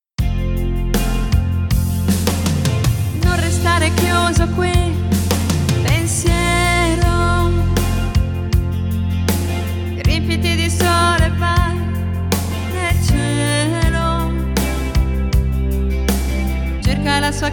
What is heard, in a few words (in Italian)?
contralto